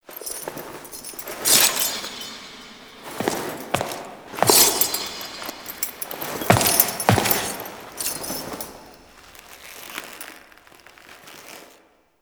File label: Romance Cin Foley A; Lyon; Warframe; Tau Romance Cinematics